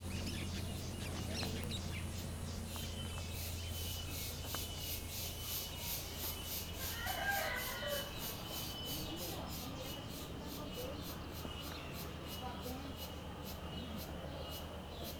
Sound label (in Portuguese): Cigarras galo e vozerio em acampamento; Cerrado; insetos; colecao sonora do cerrado